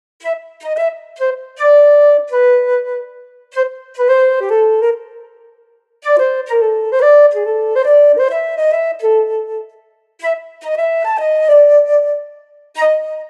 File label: flute